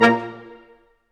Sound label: BRS Quintet stac; Roland L-CD702; Quint.Stacc